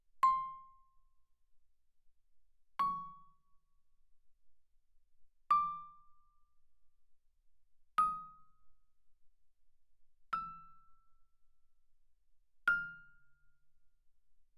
Vibraphone dampen ff C6 F6; Percussion

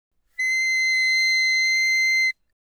Eb Clar mf C7, Eb clarinet, woodwinds

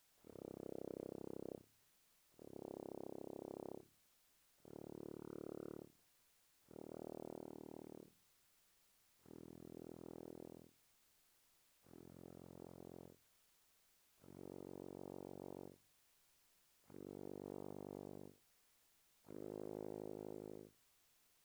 Tuba, mf, C1 B1; Brass

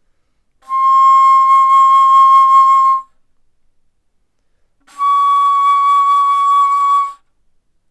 Bass Flute ff C6 Db6, woodwinds

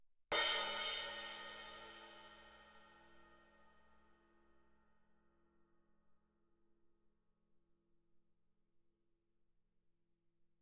crash stick normal ff, suspended cymbals; Percussion

